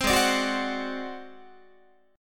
Ab 7th Flat 5th Guitar, chord; 15,16,17,16,16,17; strummed

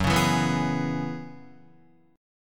Gb 9th Suspended 4th Gb down Guitar, chord; Gb9sus4; strummed; JGuitar

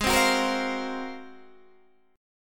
Ab 13th Ab Guitar Standard; strummed; JGuitar

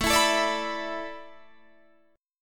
A Suspended 2nd Suspended 4th, Guitar, Open D

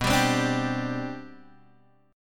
C Major 7th Suspended 2nd B, chord

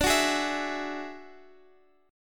F sharp 7th Suspended 2nd Sharp 5th, D

